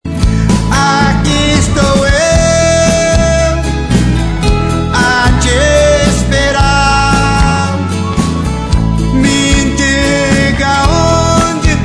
cantor sertanejo

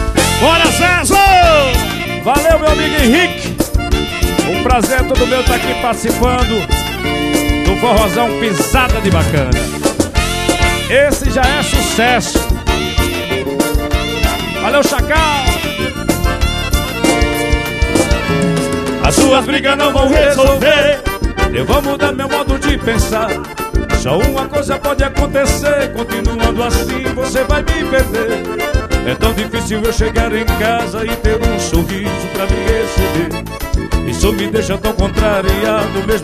vaquejada

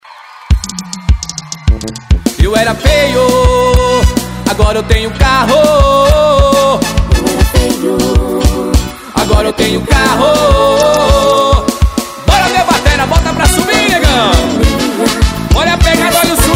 forró

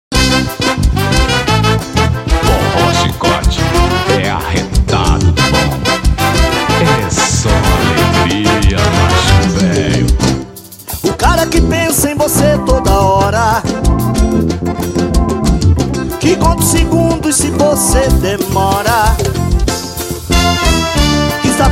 forró

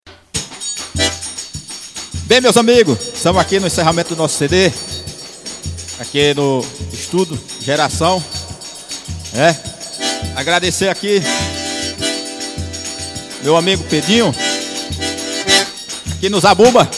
acordeon

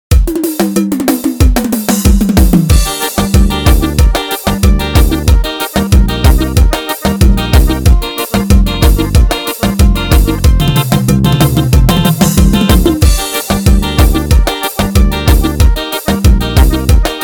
forro

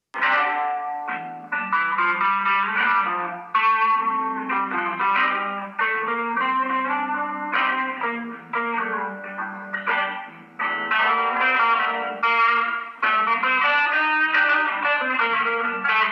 abolerado

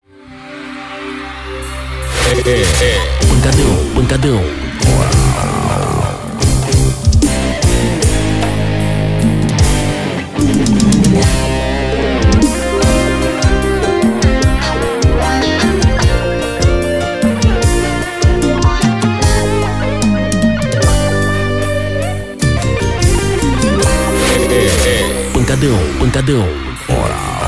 abertura

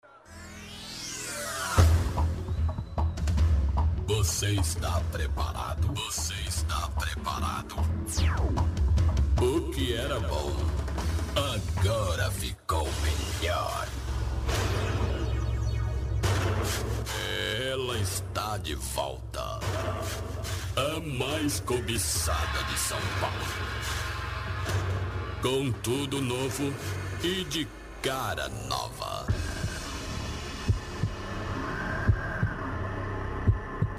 forro abertura

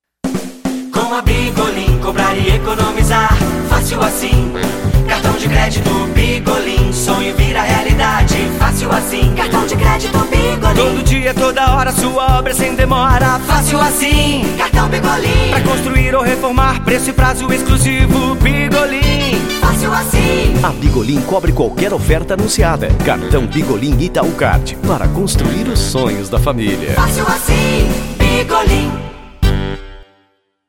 jingles

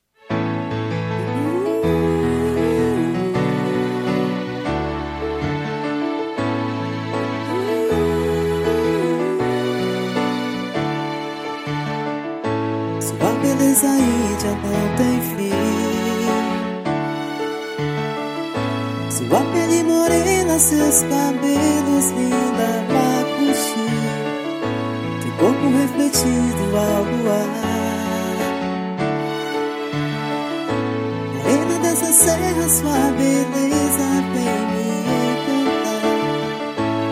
forro

